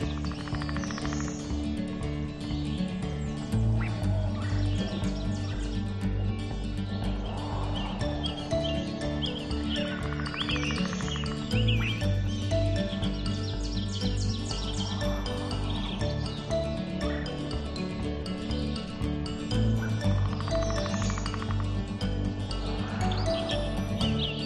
Woods Theme Amb; Puffle Wild

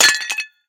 Pot Break Medium; Angry Birds Rio

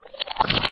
Beast Guts Door Close

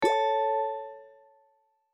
Lifebuoy Awake; my cute commissar 2020 gamerip

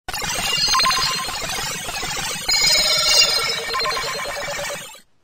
Reward; fortress destroyer 2015

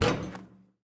Ball Miss Rim Hit; Doodle Jump 2

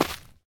Footstep Grass

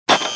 Level Locked; Angry Birds Space

